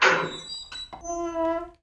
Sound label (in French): volet; cs wolfenstein